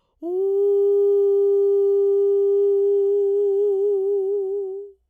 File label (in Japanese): uragoe